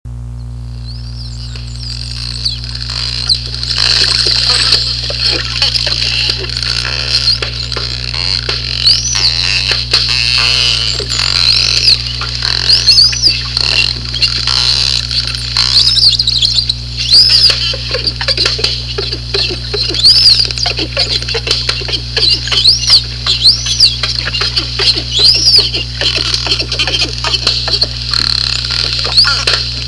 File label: hydrophone